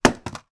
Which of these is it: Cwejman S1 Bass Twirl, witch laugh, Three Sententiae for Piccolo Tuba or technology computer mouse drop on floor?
technology computer mouse drop on floor